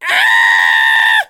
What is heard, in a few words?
halloween scream